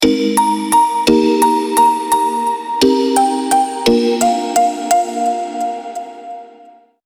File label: Melody Plucks